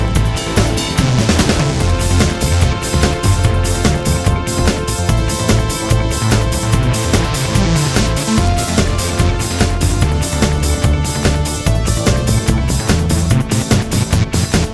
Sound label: Zoom Electronic Ringtone